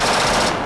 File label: gun, weapons